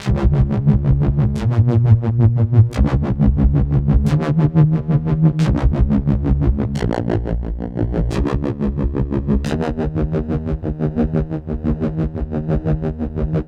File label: Huming Bass, Drum n Bass; KJ Sawka; Drum n Bass & Beyond